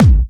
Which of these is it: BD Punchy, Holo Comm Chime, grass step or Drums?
BD Punchy